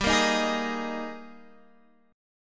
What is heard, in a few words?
Ab Major 7th Suspended 2nd G